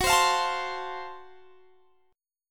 F sharp Minor Major 7th Flat 5th F sharp down Guitar Standard; strummed